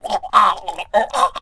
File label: choke